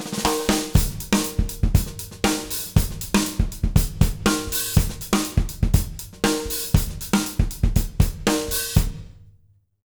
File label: 120 JROCK, Drumloops